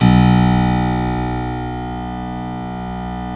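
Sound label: B0 clavinet left, Keyboards Acoustic; USB Soundscan vol.17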